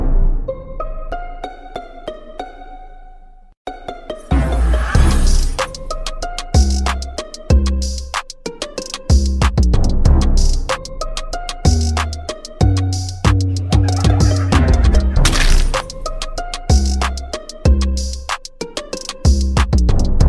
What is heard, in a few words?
Bass Boosted Electronic Ringtone